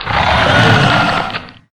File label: worm speak; npc; svencoop; morass beta